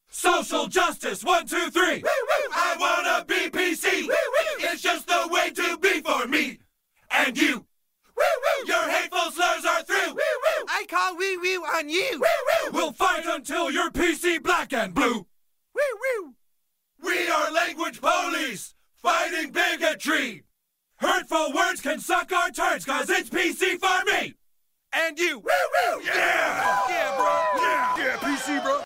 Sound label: chant